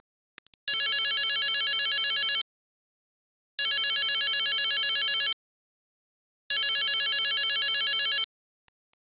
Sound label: Telephone sound